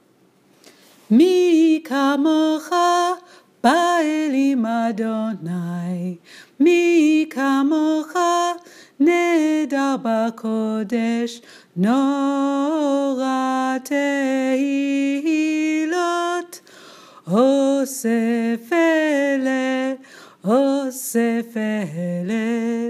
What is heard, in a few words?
mi chamocha modern melody